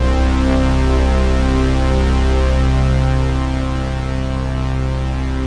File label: analog stack hit; Pads